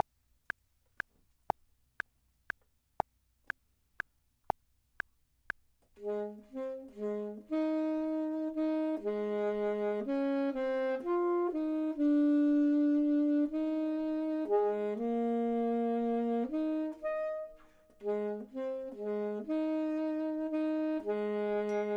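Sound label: bottom, Saxophone and Clarinet; voxman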